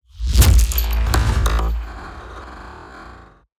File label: Impacts designed; Just Impacts; soundbits